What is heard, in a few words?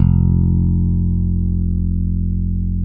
FBASS, Electric Basses; PlatinumPhattCD2; E-MU Producer Series Vol. 8 – Platinum Phatt